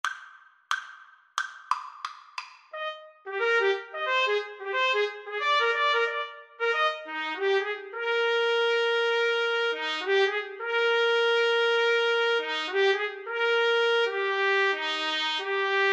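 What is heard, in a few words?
when the saints trumpet duet karaoke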